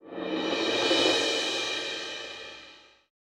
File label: Stinger Transition; skykeepers; ps4 windows xbox one gamerip 2017